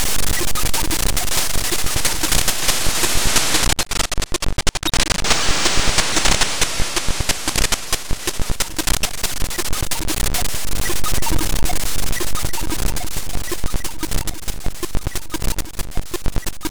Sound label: white noise